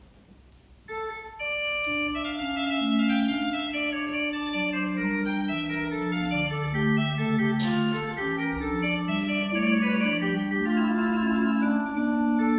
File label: cornet, orgel; assen